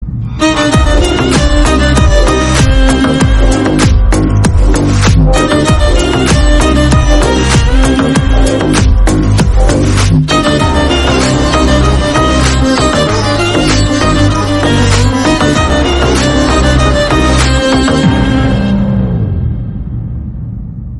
background score, tamil; lokiverse; vikram

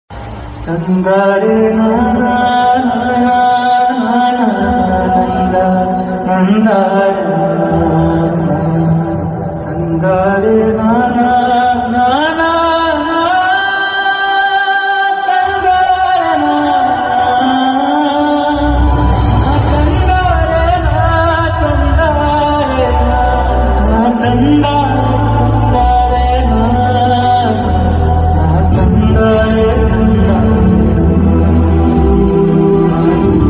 climax bgm; cobra; chiyaan vikram

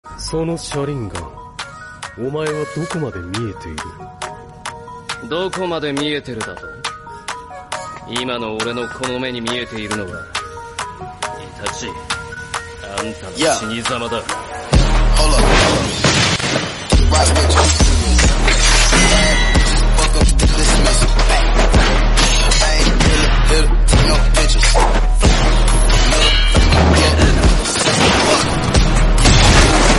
remix; Sharingan; Itachi

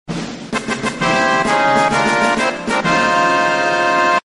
victory fanfare text

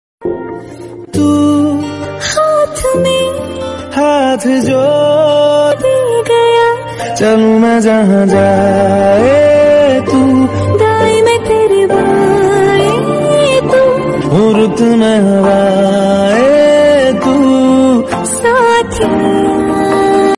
remix hindi song

